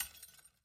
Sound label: glass breaking; angband